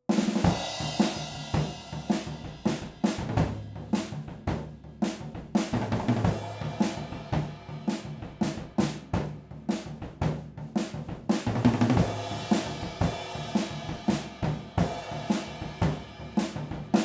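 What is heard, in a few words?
Rode OH stereo Drums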